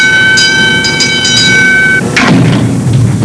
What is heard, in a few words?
cage